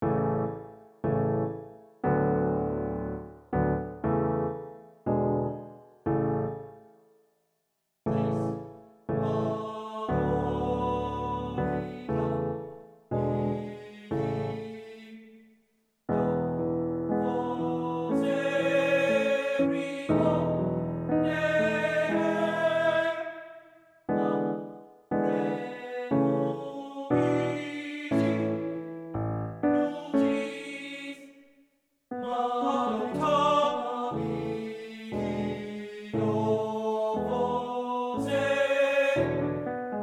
lied per voce e pianoforte; Rosetti; Am seiner beim Mondschein

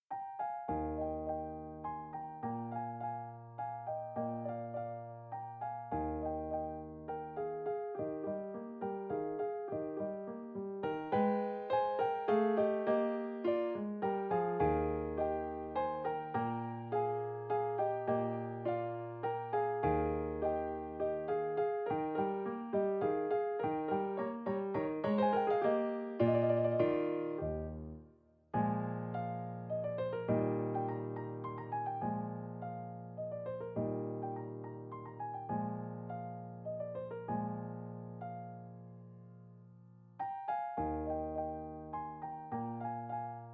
Andantino per pianoforte; Innker; Arcadienne

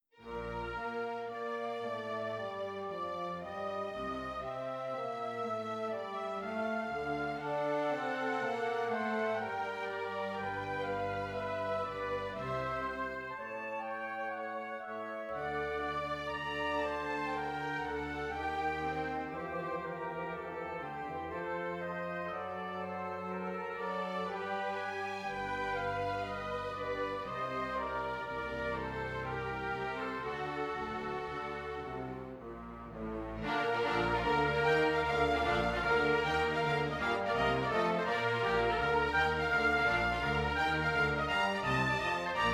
Andante Per Due Violini e Violoncello Orchestrazion

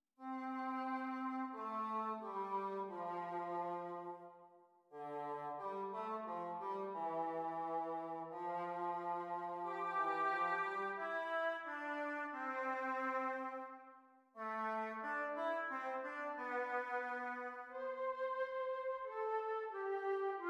Abbozzo Solo l entrata delle quattro voci; Ronge

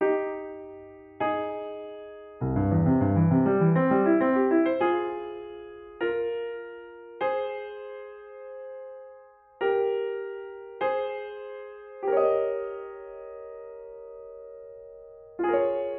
Concerto per pianoforte ed orchestra in fa minore

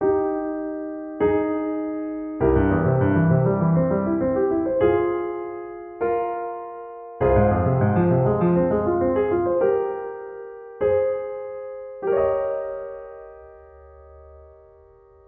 Concerto per pianoforte ed orchestra in fa minore; Completamento

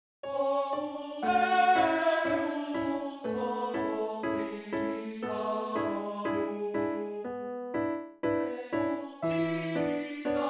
Lied per voce e pianoforte; Rehinek; An einem Akazienbaum

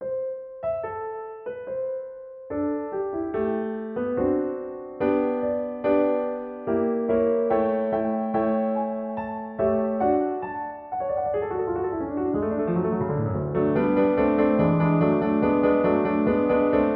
Abbozzi Inutilizzati per pianoforte

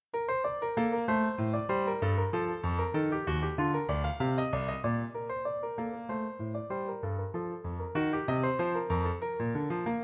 Rondo per pianoforte Allegro.; Moller

